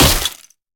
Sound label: BulletImpact Wood, Surface Effects; HQ FPS Weapons